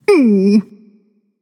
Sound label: tongue, voicepack; smite; pele